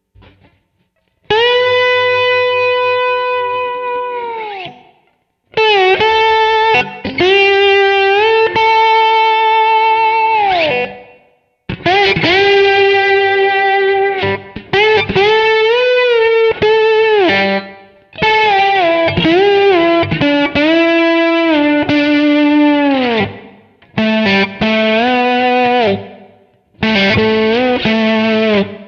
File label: Nocturne Slide